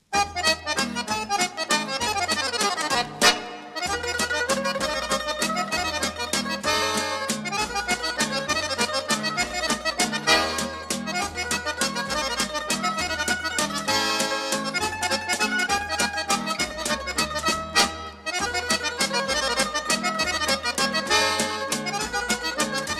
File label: Saltarella Mazurka